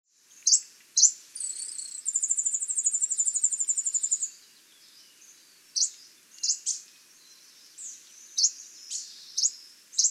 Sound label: Lemon rumped Warbler Phylloscopus chloronotus